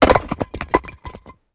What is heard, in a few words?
building fall small; tanks; War Thunder